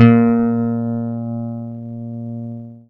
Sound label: PLUCKED, Synclav FM, A#; Big Fish Audio